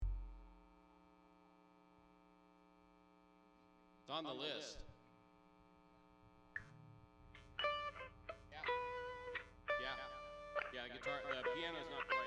Strings Mix

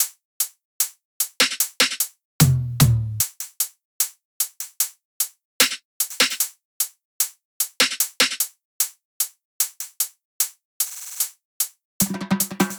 tops 150, Drum Sample Pack Loops Top Loops; SD SEISMIC; Slate

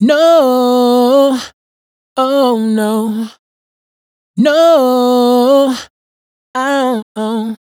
riff no 110 A, Dry Vocals; Raw Vocals; Slate Digital Omnivox Vocal Sample Pack

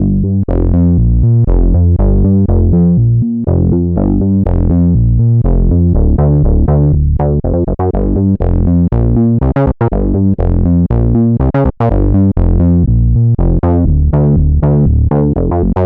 Synth loop 121 Gm, Synth Bass Loops; Slate Digital BASSHOLE Sample Pack

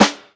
snare mapex; Drums; One Shots; Slate; AURORA Sample Pack; Snares

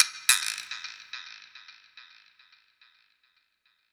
percussion one shot; oblivion; Slate; SD PASADENA Pop Sample Pack

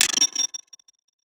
perc broken glitch; Drum Sample Pack; One Shots; Slate; SEISMIC

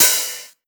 Open Hat Natural, 909; Slate; Vintage Drum Machines Volume 3 909 Edition

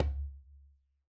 djembe Single Hit Right Hand Bass; Raw Perc One Shots; Slate; SD SEISMIC Drum Sample Pack

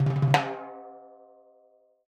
timbales Low Roll; Raw Perc One Shots; Slate; SD SEISMIC Drum Sample Pack

